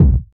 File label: sharp kick sample a sharp key, Royality free kick single hit tuned to the A# note; kick drum samples